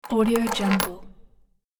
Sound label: fumbling with coins